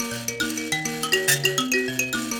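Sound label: grand mbira phrase, African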